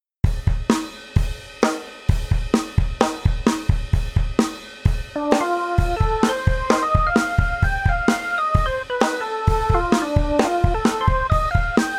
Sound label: Sample drum